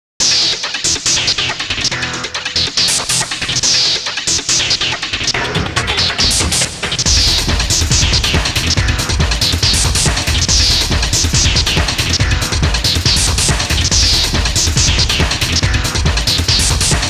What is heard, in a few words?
Battle BGM; pachislot kamen rider dx; Kambu